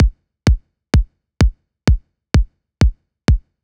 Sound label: Big EDM Kick Loop, 128 BPM; Future House Tools